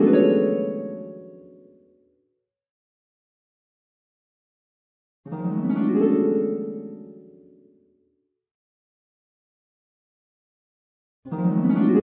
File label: harp; звук для саундтрека арфа